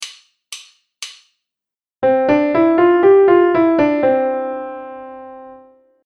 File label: 5tone scale